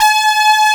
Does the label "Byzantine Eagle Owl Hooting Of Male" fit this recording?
No